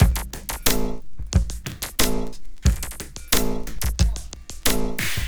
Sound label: Smooth, R&B Drums